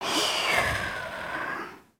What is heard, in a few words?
snore out